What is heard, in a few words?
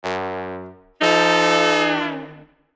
fail; rpg